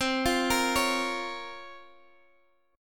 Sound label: Bb Minor C, Bbm/C; Bbm/C arpeggiated